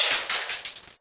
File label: analog; analogBully; Ableton Foundation FreePacks; Wicked Kits